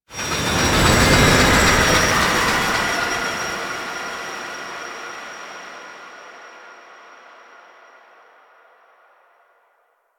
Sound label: train passby; ambience; Warframe; Entrati